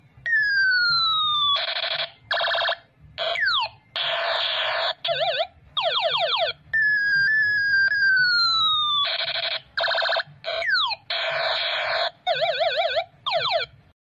nada dering mainan tembakan, suara mainan tembakan anak jadul; nada dering lucu suara Pistol Mainan Anak

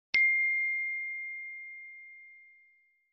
nada notifikasi wa simple

